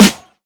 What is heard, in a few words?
Snare Heavy, drums; One Shots; Future 2